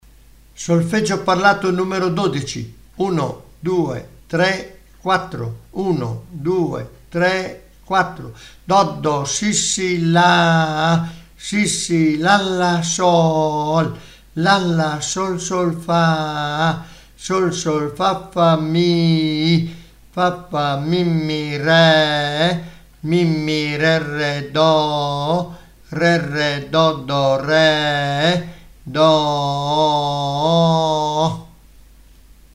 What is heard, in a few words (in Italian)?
Solfeggio Il mio amico flauto